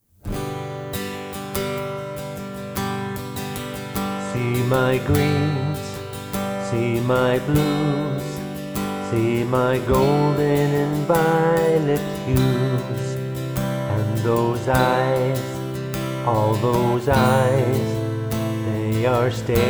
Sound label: Peacock song practice